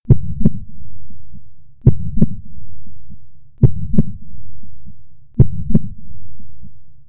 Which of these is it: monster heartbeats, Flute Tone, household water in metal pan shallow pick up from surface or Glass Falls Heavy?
monster heartbeats